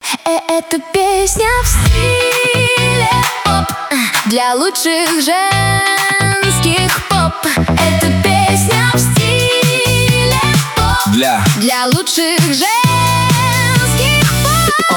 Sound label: pop; v stile pop